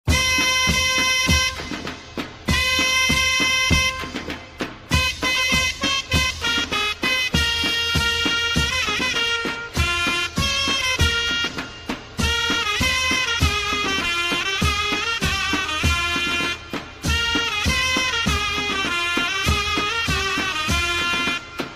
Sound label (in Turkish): Osmanlı Mehter Marşları